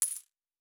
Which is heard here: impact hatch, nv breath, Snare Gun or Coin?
Coin